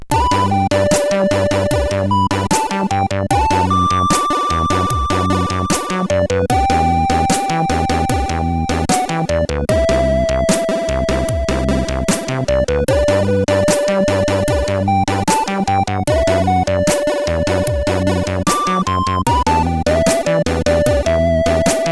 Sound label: sid CSG8580R5; Sunflex; hvsc